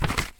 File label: Paper, Handle, Notepad, Page Turn